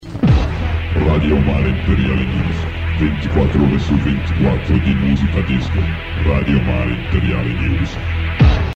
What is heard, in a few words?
jingle; rmi